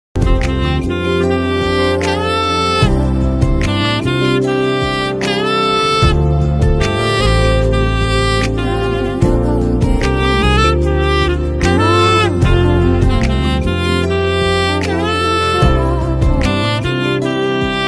Sax Rendetion

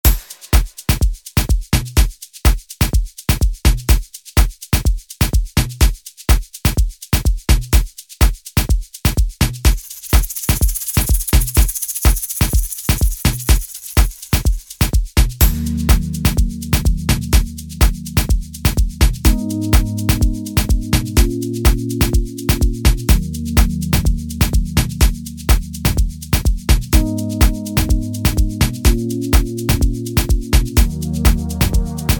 Sounds Of Rain

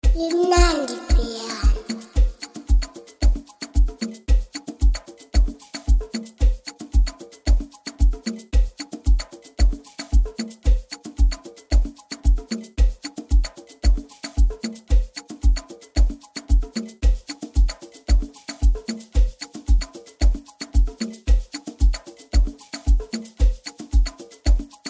Amapiano Live Stream Sessions

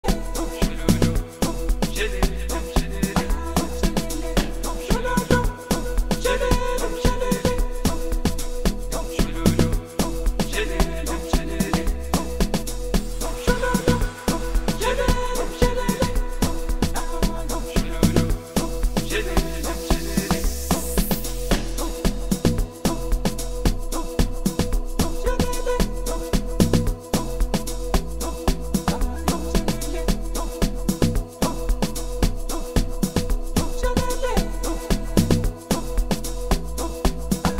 live stream mix

